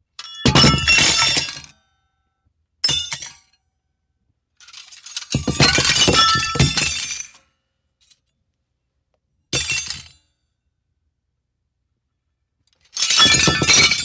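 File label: Cutlery China Var Light, clay & ceramic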